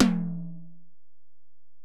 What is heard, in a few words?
Tom, Alesis DM-PRO